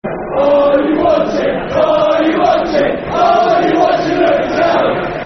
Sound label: Are You Watching Luton Town, Football Chant (Soccer Song); Watford Wfc